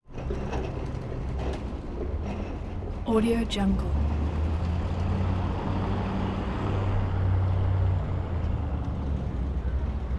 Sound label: tecate mexico constant residential area